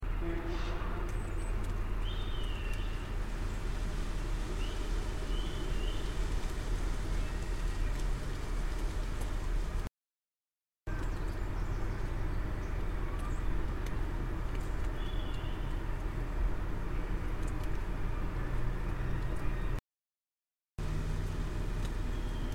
whistles

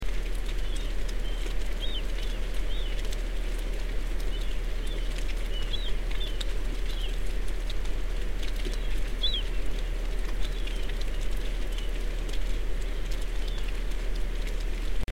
mystery calls

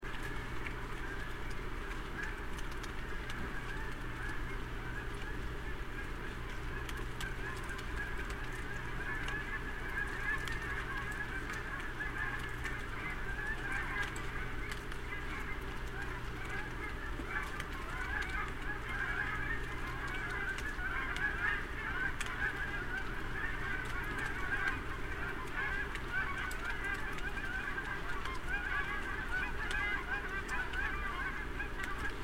geese

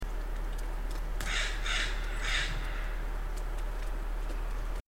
northwestern crow

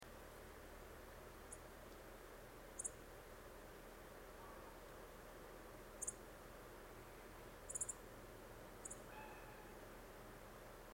bird chirps

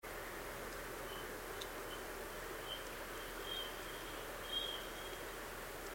marbled murrelet

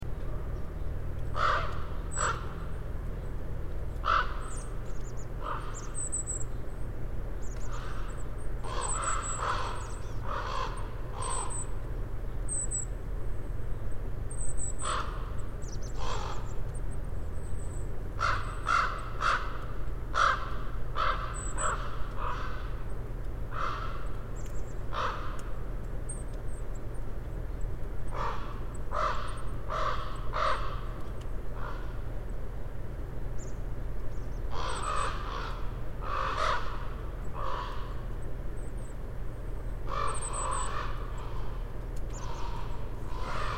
bird calls

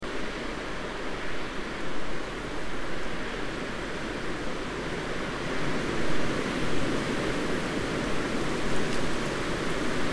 wind gusts

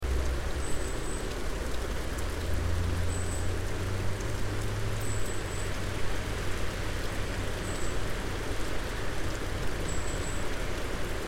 high calls

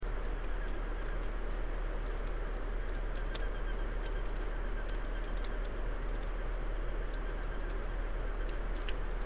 duck flight

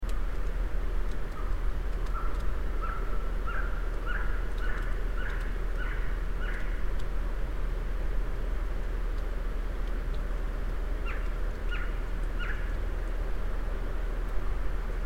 western screech-owl

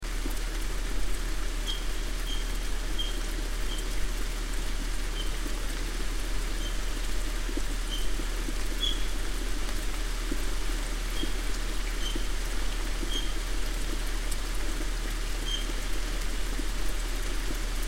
chirps

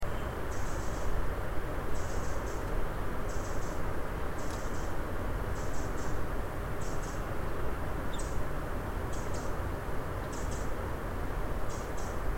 bird calls

